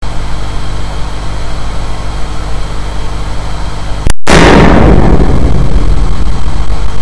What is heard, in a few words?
Beam On Collimator